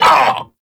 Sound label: Vocal Set Pain; Warframe; Duviri; Dax